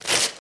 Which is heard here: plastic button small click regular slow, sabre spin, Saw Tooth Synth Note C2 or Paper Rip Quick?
Paper Rip Quick